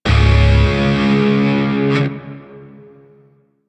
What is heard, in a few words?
Shawzin Hirajoshi Chord E; Grineer; Warframe